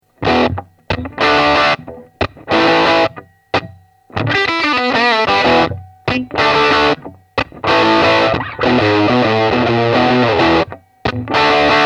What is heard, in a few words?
Lead